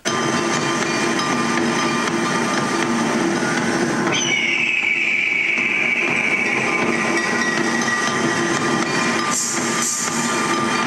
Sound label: eagles call ringtone; samsung galaxy s3